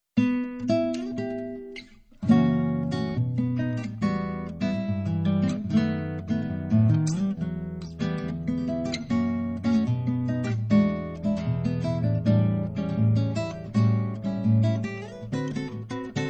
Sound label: Acoustic Moods